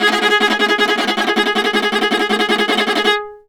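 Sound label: viola tremolo G#3; Orchestral; DirectWave; Varazdin Orchestral; Viola II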